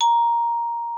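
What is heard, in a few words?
xylophone A#4; Xsample VOL-4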